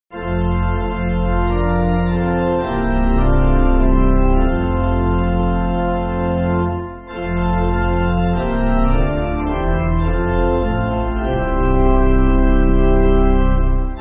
Pipe Organ, Music Backing; Ben Avon

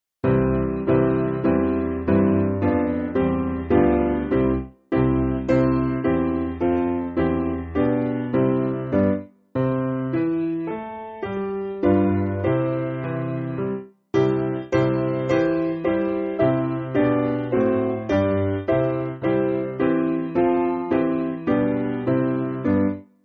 Piano; Light Of The World; Hull

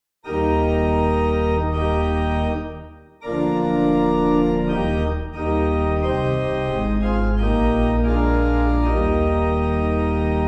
He Is Calling, Pipe Organ; 4/Eb; Sankey

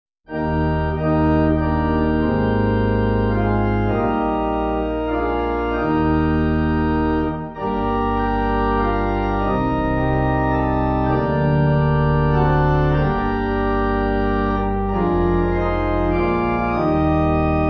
Pipe

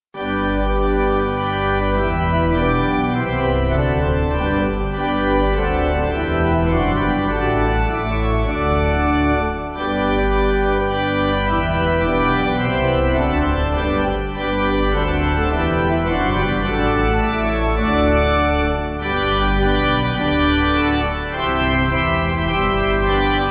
Pipe Organ, Music Backing; Dyro Inni Fendith; Caersalem

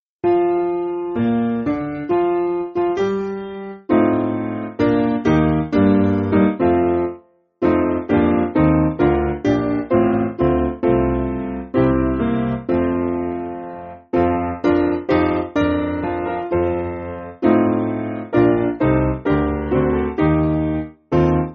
Hail Harbinger Bell SPiano

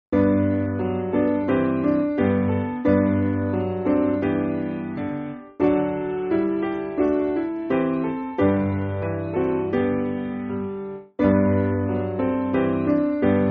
Peace Is Flowing SPiano

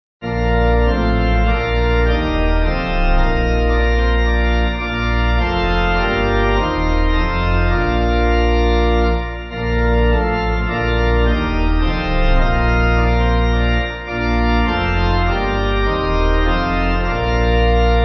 Pipe; St Theodulph

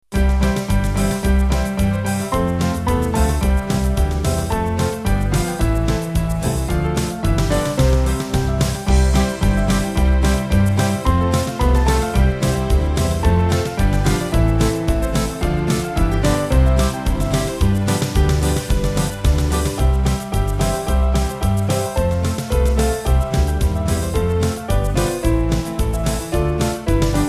Band

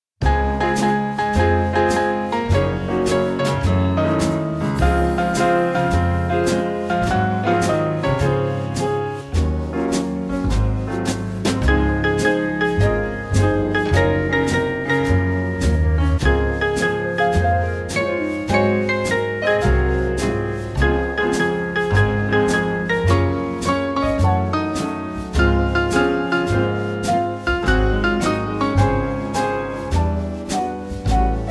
Band

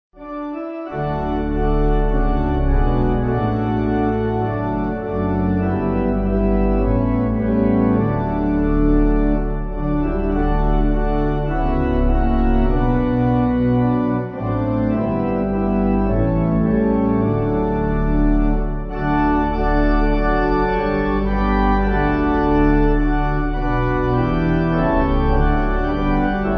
Pipe, Pipe Organ; I Lay My Sins; Crucifix

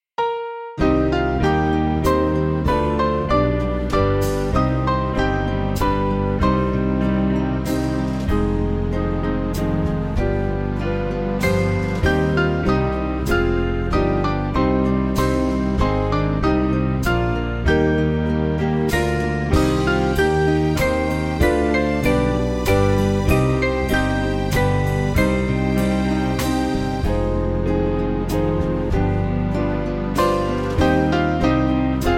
Thy Kingdom Come, Band; Small Band; 5/Cm; Blackburn

